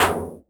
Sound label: Elektron Monomachine, Drum Machines